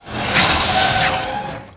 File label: door close